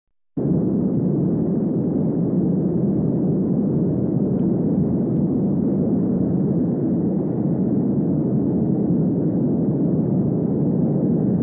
Strashny gul morja

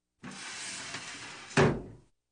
Dver medicinskogo kabineta na polozkah

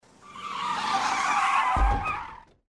tormoz sbili padenie

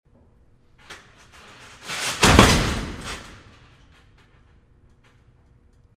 padenie sportsmena v rjeslinge; Звуки падения человека

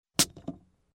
vozduh pri otkryvaniee

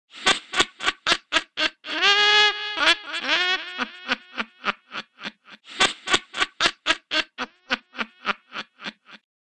smeh vedmy

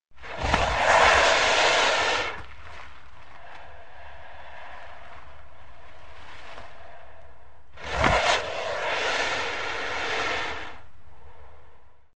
Shipenie alligatora

